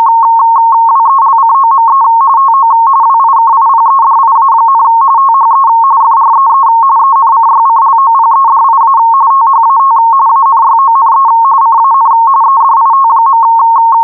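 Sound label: digital RTTY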